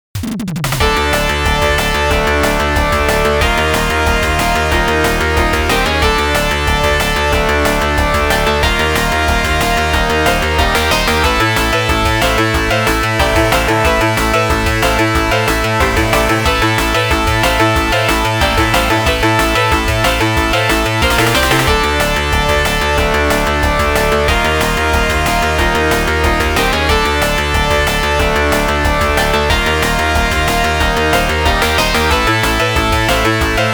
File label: Battle Music Outtrack (FM); etrian odyssey sound track proto type